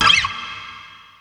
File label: squeaky